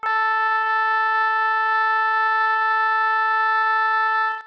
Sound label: oboe, synthesized